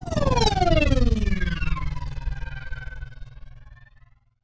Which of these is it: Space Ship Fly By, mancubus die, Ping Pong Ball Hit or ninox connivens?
Space Ship Fly By